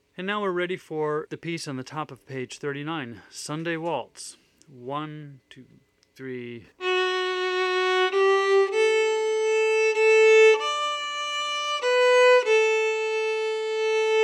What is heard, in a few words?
Waltz; Sunday Waltz